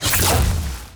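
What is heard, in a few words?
blood magic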